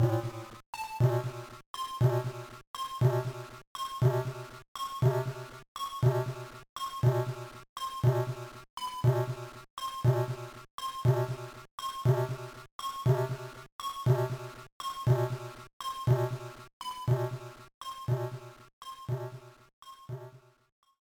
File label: Coin Loss; Wario Land IV